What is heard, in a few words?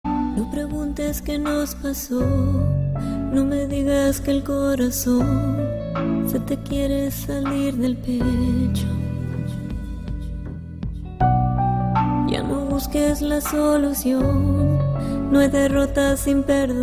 Sad music